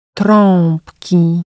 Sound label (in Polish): trabki